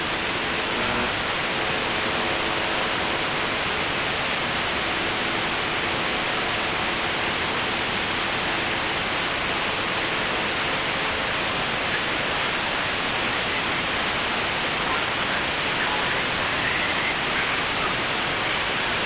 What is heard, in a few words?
buzzer usb msg